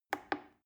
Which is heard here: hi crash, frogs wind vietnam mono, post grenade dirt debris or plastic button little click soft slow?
plastic button little click soft slow